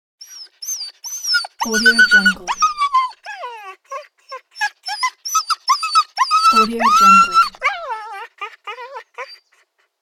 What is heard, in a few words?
dog whimper